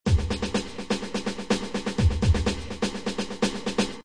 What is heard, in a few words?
drumloop